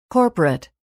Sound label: corporate